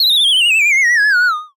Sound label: Comical Descent